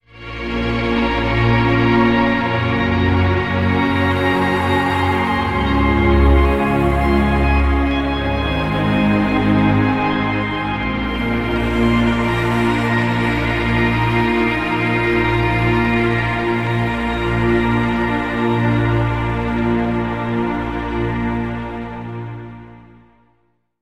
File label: alarm ringtone